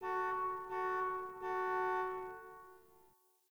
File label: Parking Lot Distant Car Horn